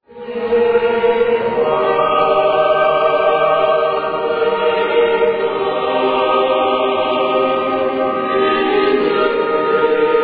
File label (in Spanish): coro peregrinos; coros solistas; wagner